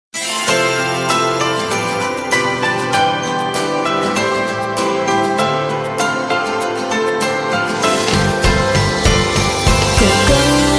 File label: slow song